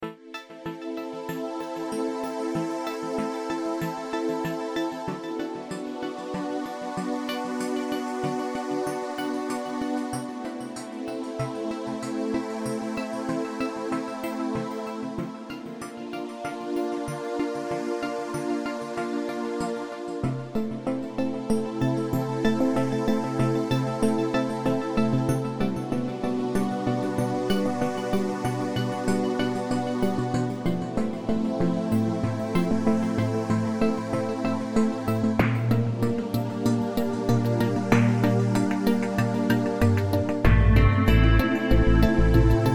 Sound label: ambient Tonal; Albino3; AXIS